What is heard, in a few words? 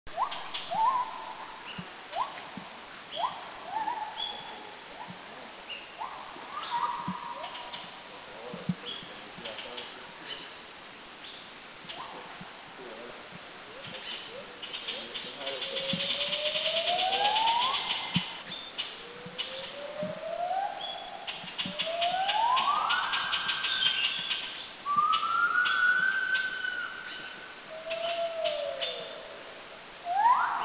White-handed Gibbon, Thailand; Kaeng Krachan National Park